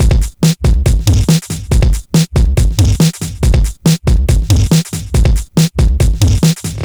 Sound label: Loops BB 140, BreakBeats; Vengeance Samples